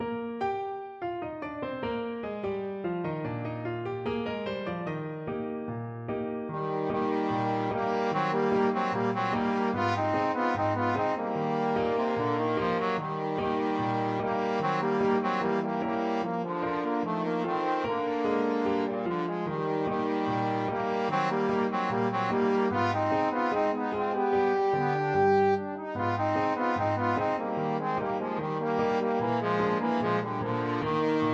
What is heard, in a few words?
trombone duet